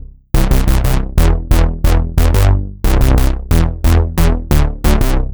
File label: Compressed, rap